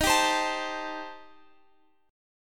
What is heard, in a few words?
Eb 6th Flat 5th Eb down Guitar Open F, Eb6b5 chord; Eb6b5 strummed; JGuitar